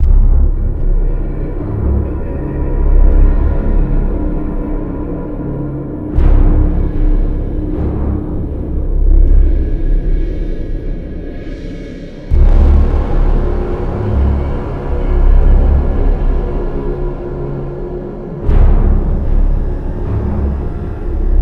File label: Pause Menu Loop; extinction deluxe edition; ps4 windows xbox one